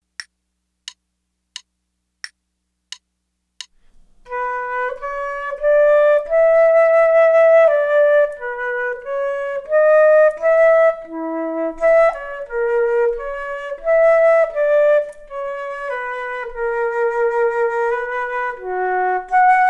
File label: Duets flute; Selected Duets for Flute Podcast